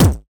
Arrow Kick Thwacking Pulse

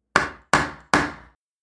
Gavel

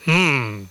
Hmm

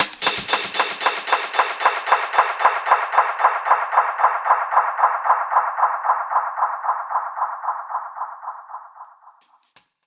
Fx Dub Echo; Zulu

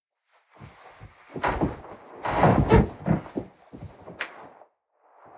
Bed Movement

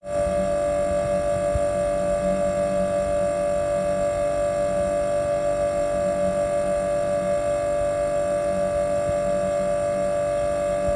Fridge Drone

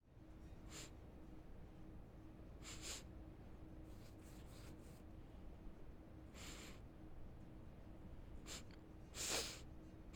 Sniffing

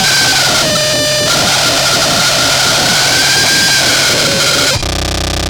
Noise Sample

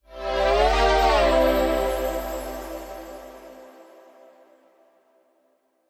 Gasp Transition Sweep

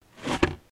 Yank Sound Effect

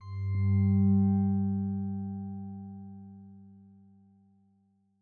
Magical Glowing

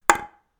Wooden

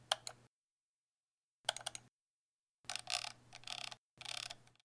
Mouse Click And Scroll

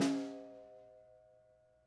Snare Low Vol

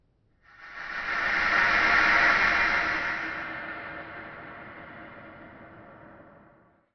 Horror Moment

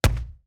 Hit

